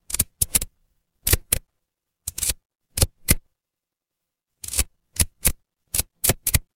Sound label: mechanical lever